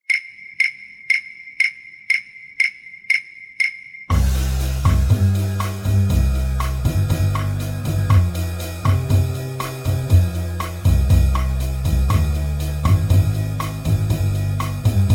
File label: Piano Guitar 120